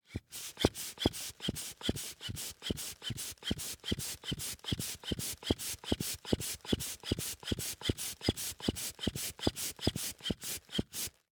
Handbellows, leather, wood, brass, pumping, wheezing, blowing, supple; Eiravaein Works; Vaeyan IV